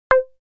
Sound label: Shorty, synth lead